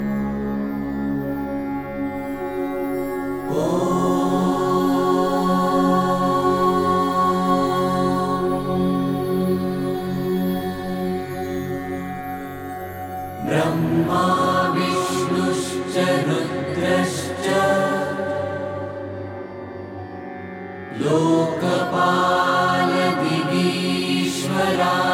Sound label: tilak mantra